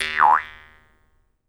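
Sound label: Jaw Harps, Pitched Percussion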